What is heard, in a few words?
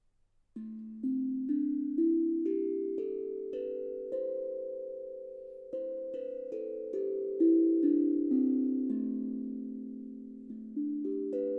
A minor pentatonik